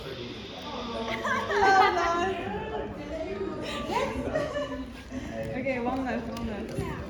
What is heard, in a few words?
Laughter; "ok, one left one left"